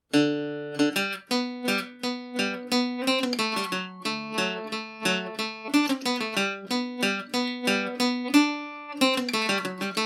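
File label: G major at 40, irish mandolin; rakes of mallow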